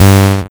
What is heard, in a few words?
lighting smash; opensurge